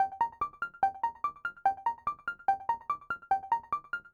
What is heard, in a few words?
loading loop; Pix the Cat